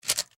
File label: Click Mechanical Weapon Clip Latch; Latches